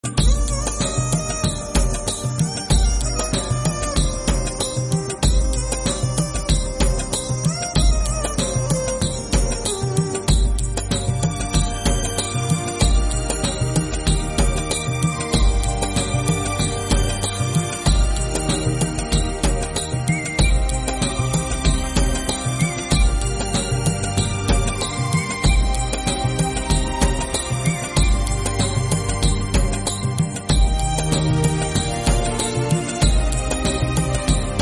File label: taal music